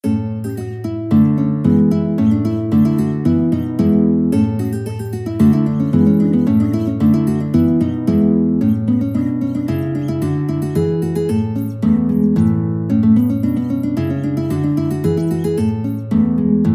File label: English Dance, guitar